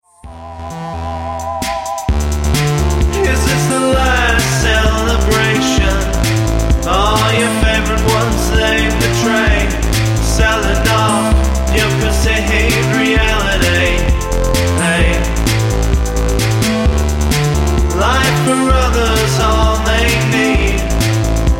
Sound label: claps